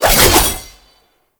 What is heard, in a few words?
Passive Sickle Spin; Warframe; Dagath